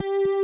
GTR BASS; Pro-Rec - Synthsonic; BASSES

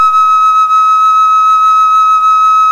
FLUTE & BELL; OMI - Universe of Sounds

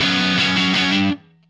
Happy Pub Guitar Ending, Indie Rock; Sonic Foundry (Sony Creative Software); Indie Rock Anthems; Session 05 - Happy Pub

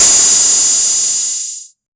Hat Open, 606; classic machines

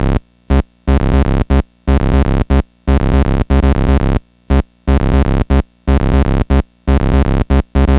Atari 2600 Bass, chiptune

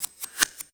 LOOP PC, Drum & Bass, 160 BPM; Ueberschall; Drum & Bass Flash Trackz

